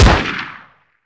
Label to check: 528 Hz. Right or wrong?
Wrong